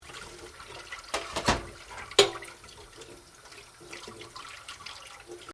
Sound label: Washing Dishes Running Water; Household Appliances; Appliances (Sound Effects:Household)